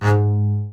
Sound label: G#2 SPICCA, ARCO BASS